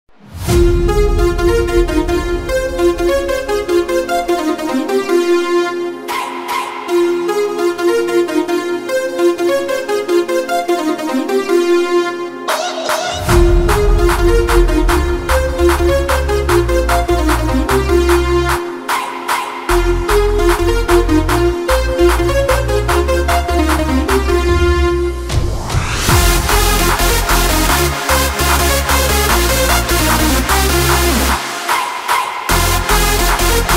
phonk remix

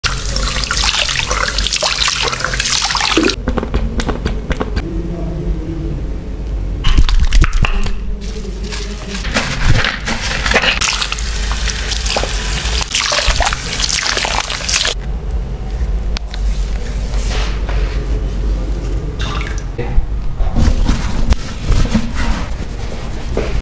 asmr hair wash; asmrhairwashing

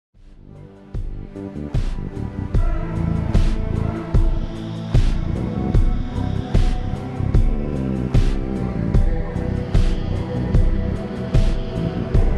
instrumental slowed reverb

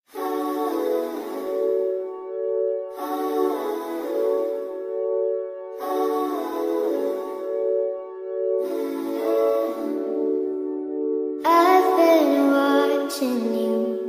sped up and reverb

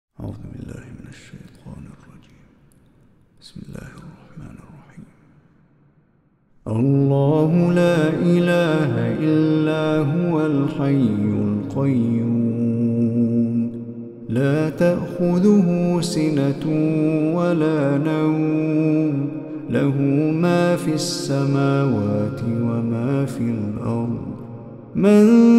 ayatul kursi full beautiful recitation

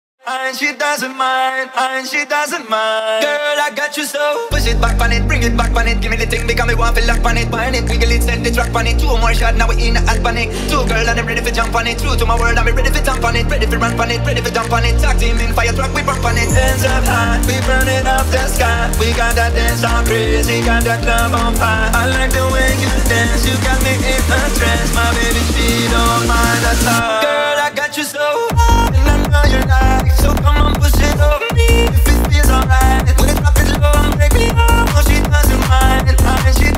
techno version

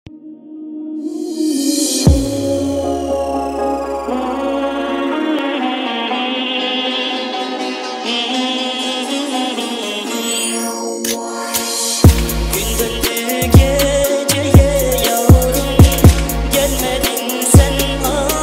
trap remix

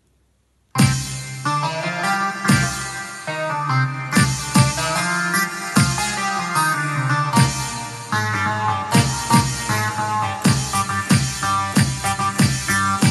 korg pa 80; fidayda

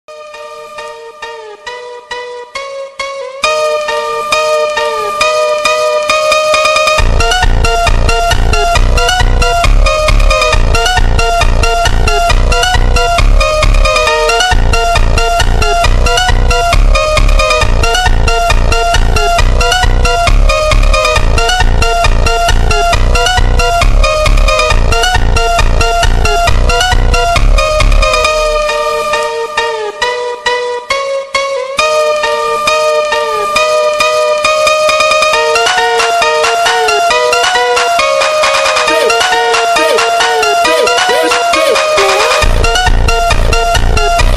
funk speed up phonk bass boosted

